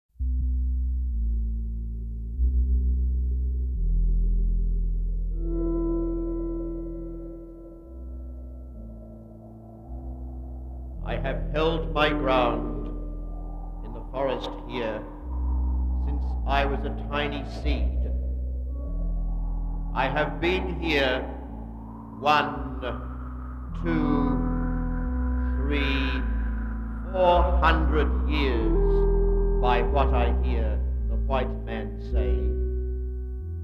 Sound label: Backing track